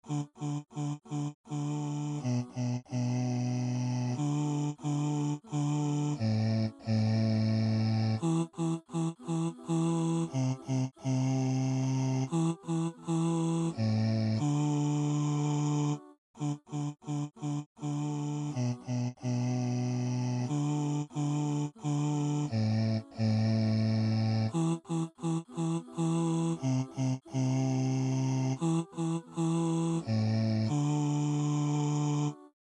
Bass

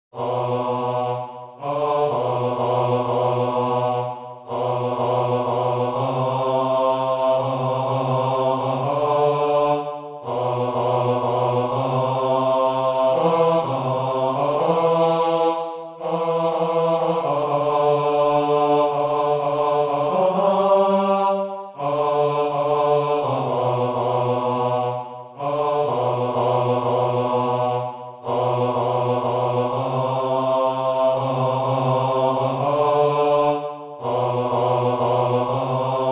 Bass

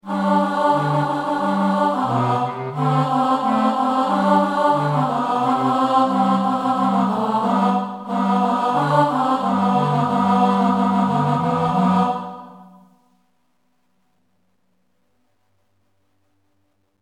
choir Alto

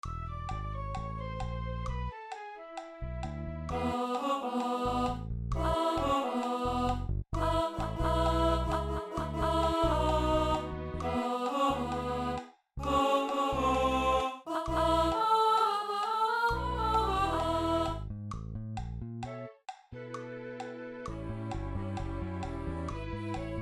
Alto

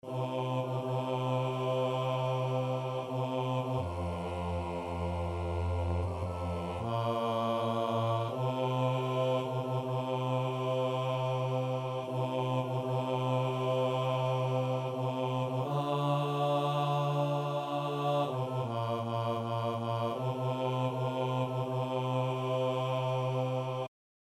Chorus bass

